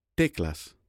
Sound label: musical instruments